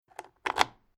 Quad Bike Motor Bike Key In Ignition; Transport Agriculture; Atv Sound Effects

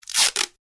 tape sticky rip; Sticky Tape Being Ripped / Pulled From Roll; Offices

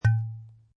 Wine Bottle; Open cork. Wine bottle open, removing cork carefully, open bottle; Drink Mixing; Bars; Lynne Music

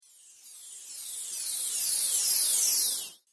Elf Spell, Magic Sound Effects; Santa

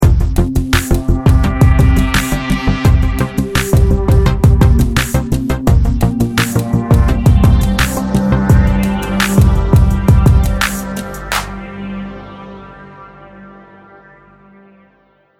Music Ident 85 Bpm; Musical Sound Effects

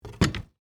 Wood Object Down, Set, Down, On, Wood; Foley; Wood Sound Effects; Wood (Sound Effects:Materials)

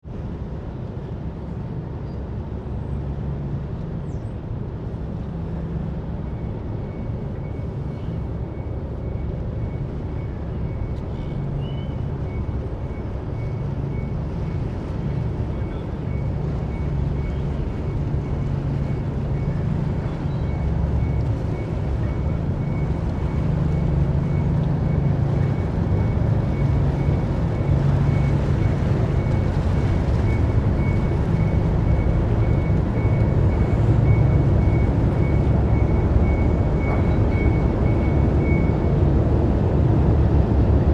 Ship Car Ferry arriving at Dock; Tom Hutchings

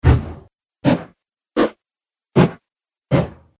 Passing Air Swooshes; Whoosh; sound design

